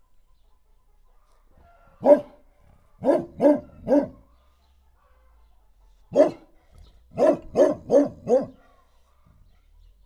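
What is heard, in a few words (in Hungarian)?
kenyerét a vatmegette, kutya; parasztudvar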